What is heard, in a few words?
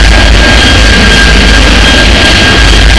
engine